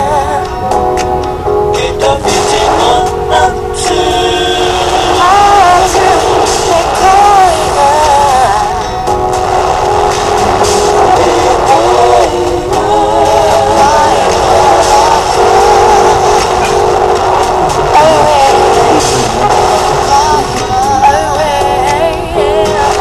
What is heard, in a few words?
example of multi path interference